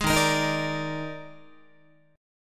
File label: D sharp Major 7th Suspended 4th, D sharp, down, Guitar; strummed; D#M7sus4; 11,11,13,13,14,13; JGuitar